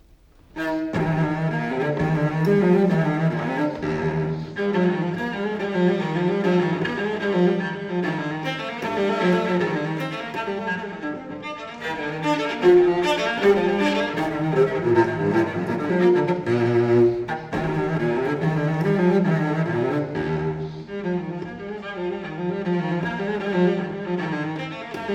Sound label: suite for cello solo no 4 in e flat major gigue; johann sebastian bach